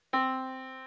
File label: yamaha Disk